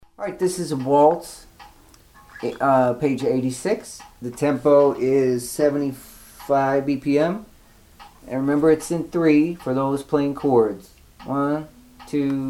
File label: waltz